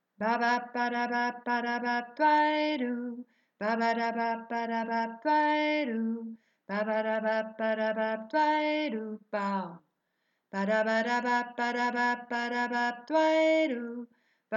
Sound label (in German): Hintergrund - Bass